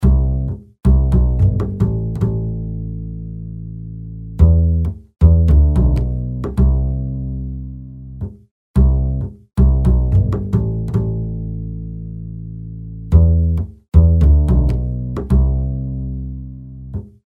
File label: Bass EQ Komp Inflator; Pearlcoder